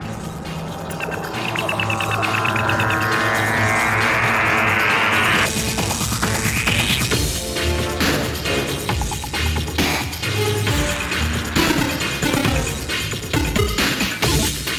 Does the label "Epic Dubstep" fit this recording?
Yes